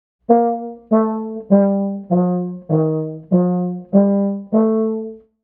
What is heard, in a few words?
e marcato